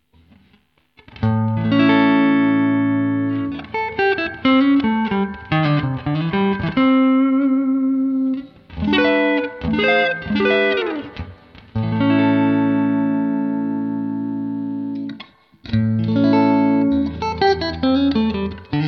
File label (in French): Toutes positions - sons clair, micros, strat-like; Micros LAUZON; Ils sont montés sur une strat-like de lutherie moyenne et sur un POD V2 en emulation type BlackFace avec ce preset